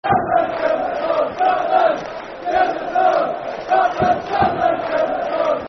Scotland Give Us A Song, Football Chant (Soccer Song), N. Ireland